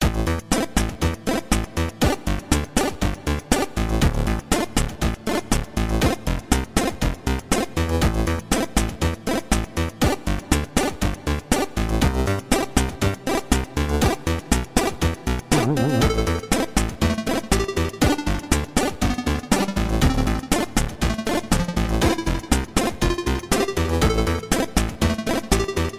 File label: Chip Tune; theme retro